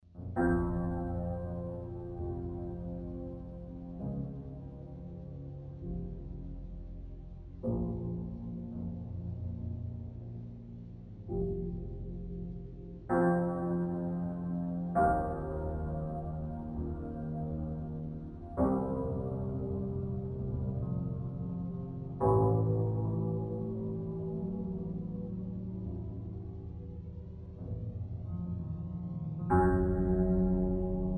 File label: Melody